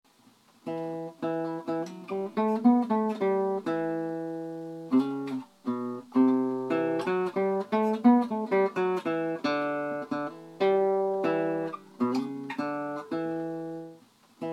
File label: Guitar Loop